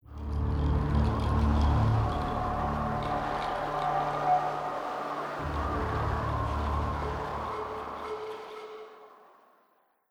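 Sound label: Woosh Music; from dust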